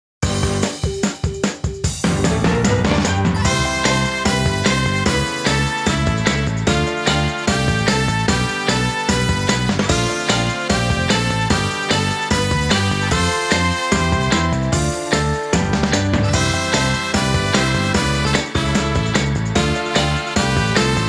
Tambourine; Rhythm Tengoku; Wii

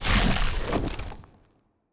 Dis Stalact Form

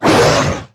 Monster Attack; Monster George

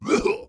Pirate Barrel

